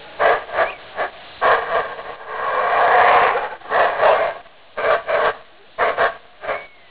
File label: howler monkey sounds